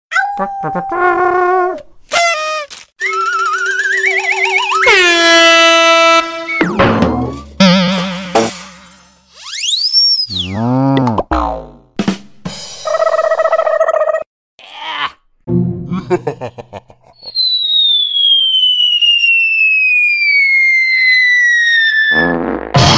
Comedy Foley; Field and Foley

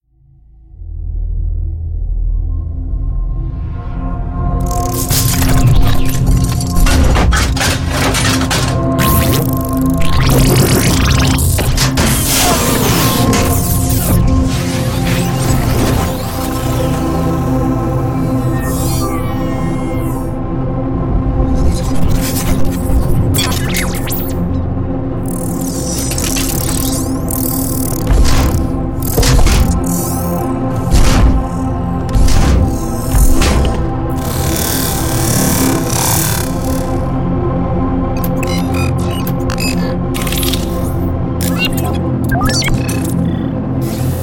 Robotic Lifeforms; SoundMorph

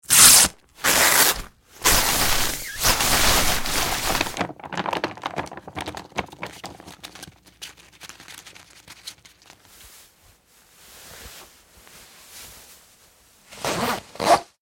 Clothing And Fabric; Big Room Sound

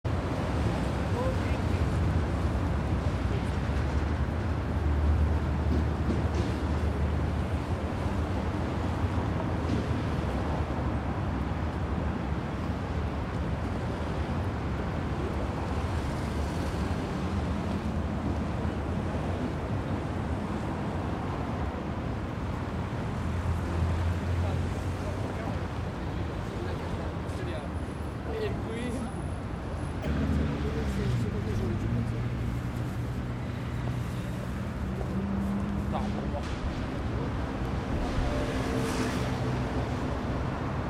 Cities of the World; Blastwave FX

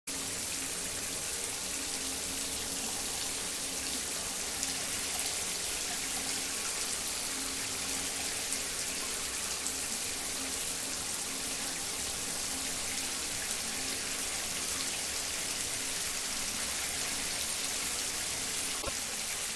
Bathroom

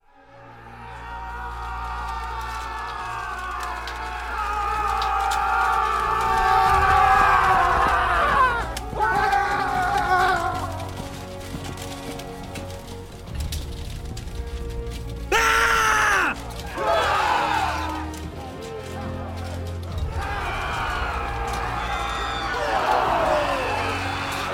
Battle Crowd; Casoundinc; The Battle Crowd Core

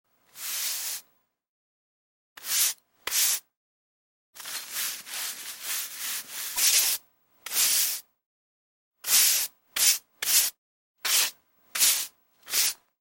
Archaeology Tools; Big Room Sound

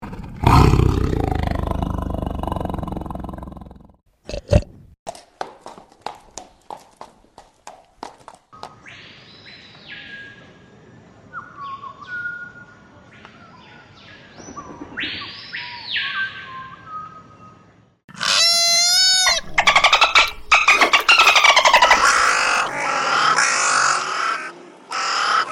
Animal Sounds; Field and Foley

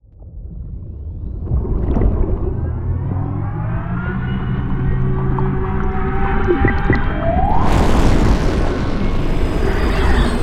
Ocean Game; Epic Stock Media

